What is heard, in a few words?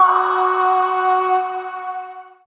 Void Shawzin Oct Two F Sharp; Warframe; Zariman